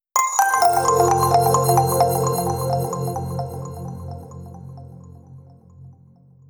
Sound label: intro accent